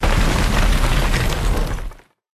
Sarcophagus Appear

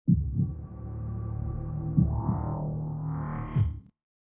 190bpm; Legends of Pegasus; windows gamerip 2012

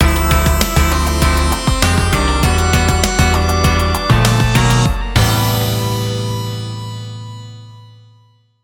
Goal Jingle

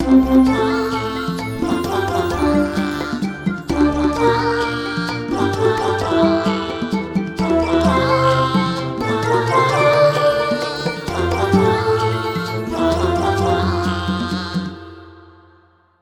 (Coral Organ Ver.); mario rabbids kingdom battle complete video game soundtrack

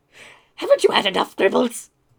Male Reactions

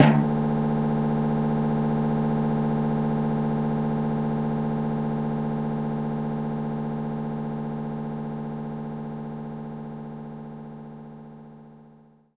Timpani D3

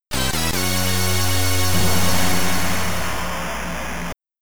Stage Clear; Munen; Ninja Spirit; amiga

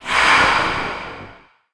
Roar; New The Denpa Men

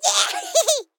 rat victory, rats

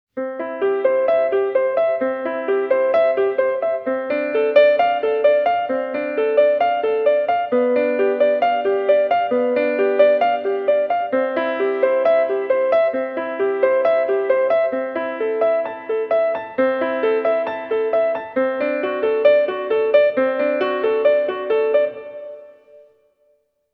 heikinritsu clavier; Mizu no Onna

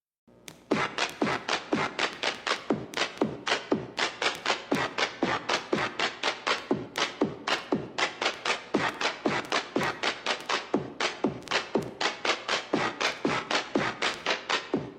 Pause Menu; battletoads

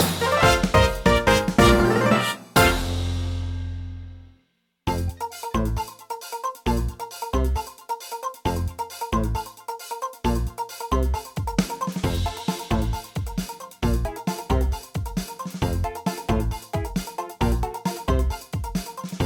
Battle Win Jingle

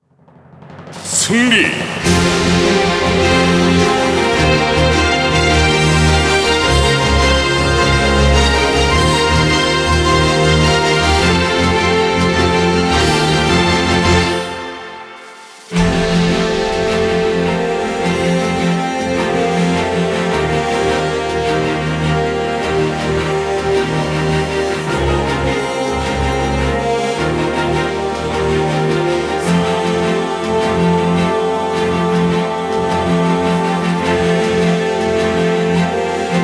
Victory; Colony; tree of savior

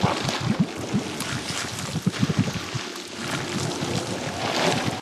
Kraken-Loop; Hero Junpai

